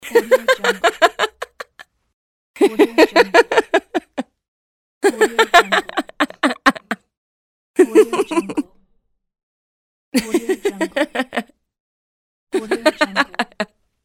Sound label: woman chuckle